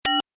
Tech beep, Beep, High Tech; Stuart Duffield

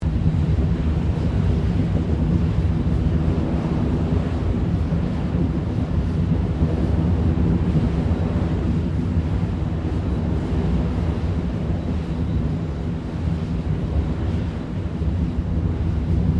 REFINERY GAS BURNOFF FLAME; Machinery; SoundEffects-Foundation4

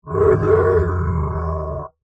Monster Groan, Horror, Monster, Groan, Creature; Monsters

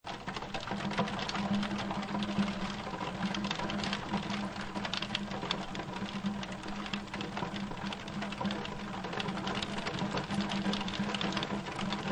Rain On Window Pane; Rain Sound Effects; Weather

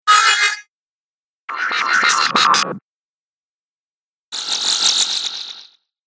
Space Zooms, whoosh; Space Zooms - Pack 2; Whoosh Sound Effects; Andrew Fleming

